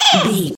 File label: goblin fairy hurt pain